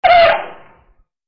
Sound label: brakes